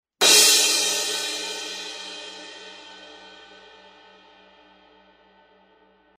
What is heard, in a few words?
Sabian 18 HHX explosion crash